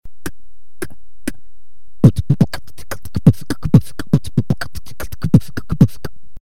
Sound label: snare